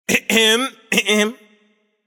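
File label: nonverbal clear throat; smite; voicepack; merlin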